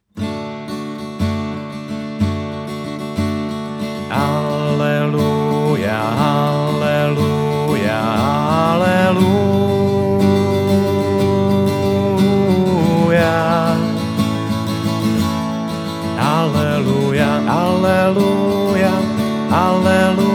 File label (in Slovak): Aleluja, G dur